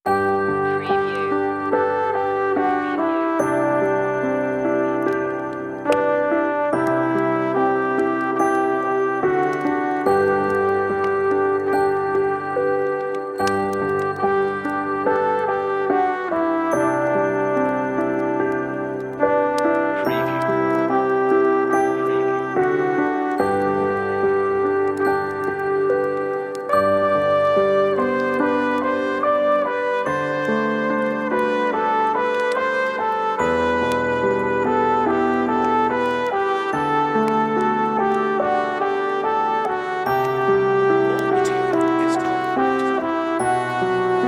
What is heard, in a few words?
Ding Dong Merrily Relaxing Christmas, on-hold music; MediaMusicNow